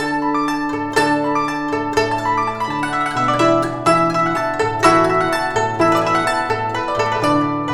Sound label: Guzheng 124 bpm C